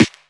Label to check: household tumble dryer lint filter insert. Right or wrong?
Wrong